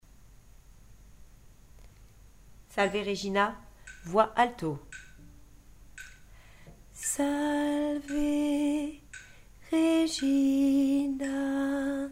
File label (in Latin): salve regina alto